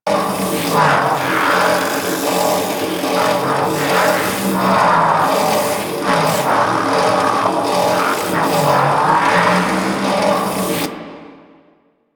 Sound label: Launch Pad Small Generator Machine Loop; Ambience; Props; Warframe; Corpus Railjack